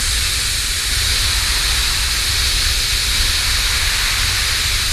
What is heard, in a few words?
posion trail loop; LoL; singed; chemicalman